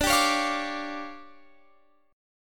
D Minor Major 7th Double Flat 5th, D, Guitar Open F; DmM7bb5; strummed